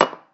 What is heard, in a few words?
Mug